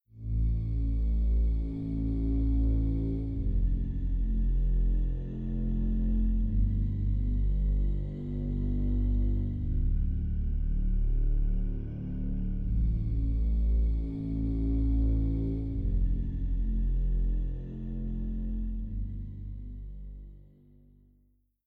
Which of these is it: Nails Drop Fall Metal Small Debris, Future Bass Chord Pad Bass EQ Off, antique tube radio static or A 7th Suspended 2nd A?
Future Bass Chord Pad Bass EQ Off